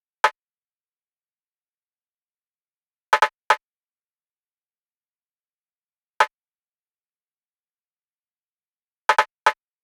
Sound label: 161bpm Percussion Loop